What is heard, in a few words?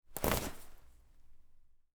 rip open jacket; prosoundfx